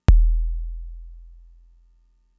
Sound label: Kick, Drum Machines Fricke MFB-522